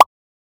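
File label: clean high pitch, reaction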